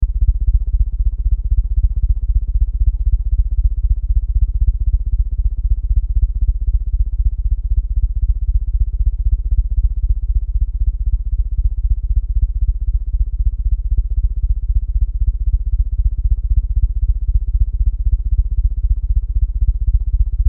fan ceiling high speed mic above loop

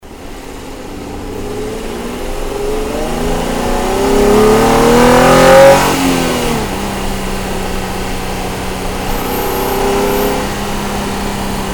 car nissan 350z engine bay acceleration rapid

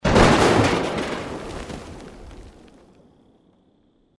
explosion detonation large stereo

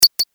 beep electronic ping double step

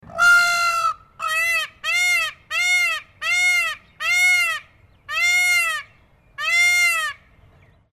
peacock

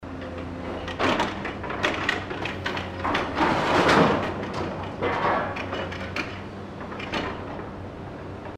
demolition factory wrecking

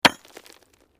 digging pick hard earth

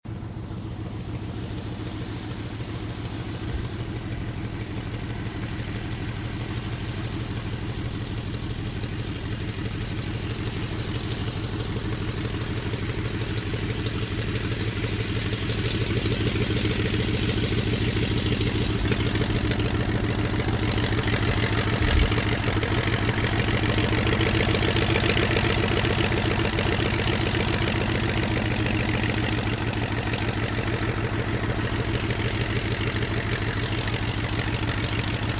aircraft 1934 avro 643 mkia cadet taxi stereo

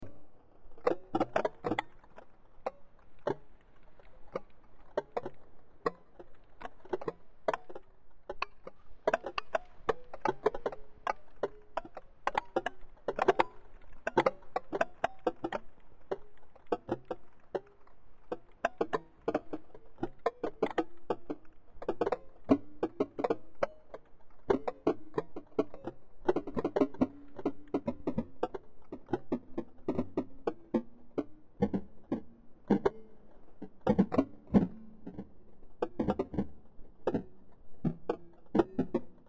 ambient texture

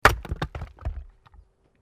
wood chopping

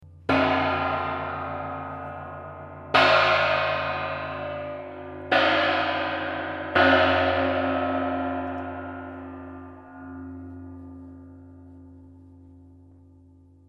gong china medium multi strike

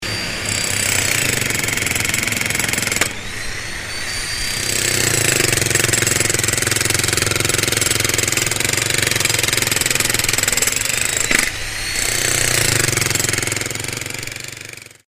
jackhammer

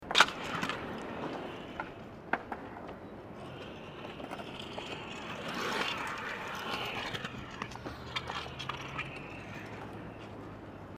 bladescooter skate bowl round bowl

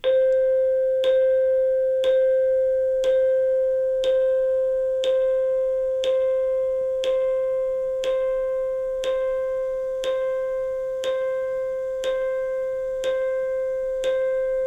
beep binaural

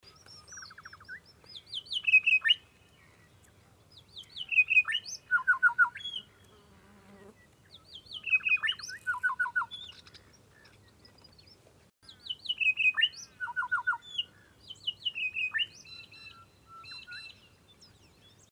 bird unknown magroves sequence

